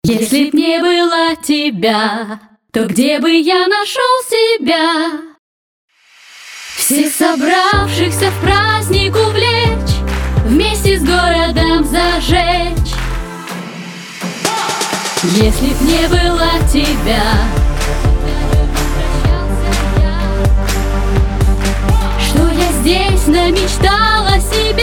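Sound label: vocal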